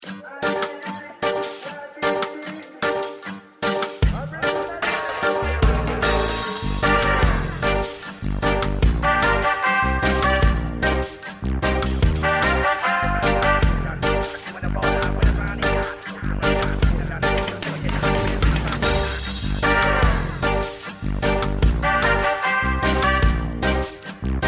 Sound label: bgm zone village; goken